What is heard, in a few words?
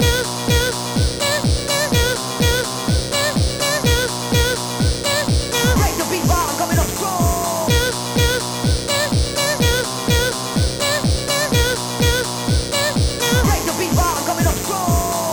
drive techno; Trackers; Modland